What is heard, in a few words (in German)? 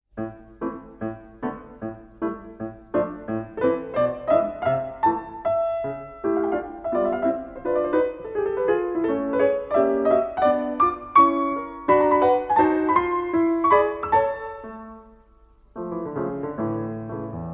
6 Stuecke fuer Klavier